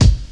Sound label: hq hip hop kick sample g sharp key